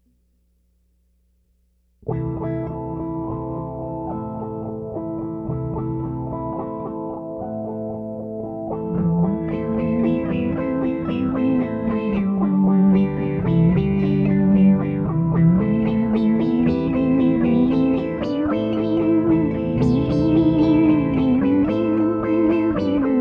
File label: Theremin